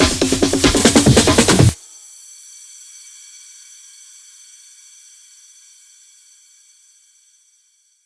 140BPM 1Bar, HandsUp & Trance Fills; Party People Short; Vengeance Samples

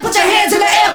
Vocal Phrases; Vengeance Samples; Vocals

